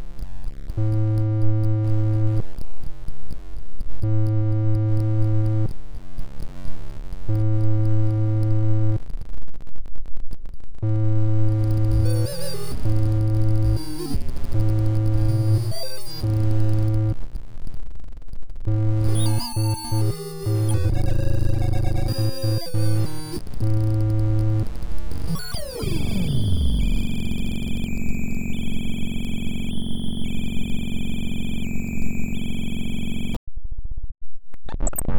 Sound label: noise